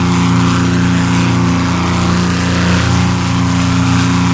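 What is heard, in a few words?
SFX - airplane propeller; Vliegtuig Propeller3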